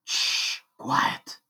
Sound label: Shhh Quiet